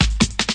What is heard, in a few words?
hard, DRUM LOOPS and BREAKS, FILLS and ROLLS